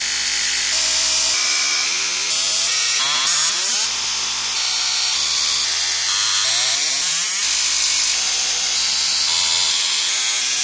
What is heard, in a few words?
Data Gone Crazy harsh grainy, digital looping effect with steady rise and fall; granular